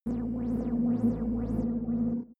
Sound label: spaceship transporter; raumschiff